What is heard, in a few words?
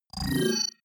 bubble tonal, sweeps swells; comedy-cartoon